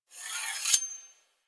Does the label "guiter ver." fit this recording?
No